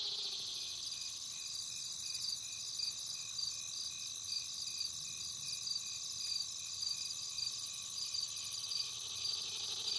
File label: cricket; 右高地